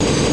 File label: turb loop